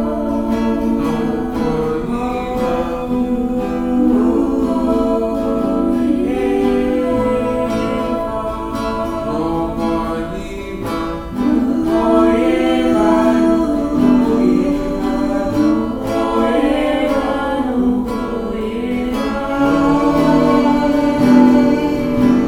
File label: syllables in harmony